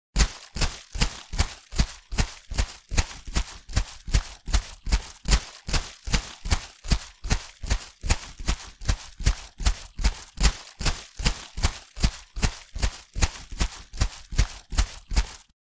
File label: Footsteps running on wet mud